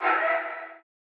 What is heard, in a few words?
FX